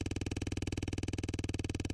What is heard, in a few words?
scroll text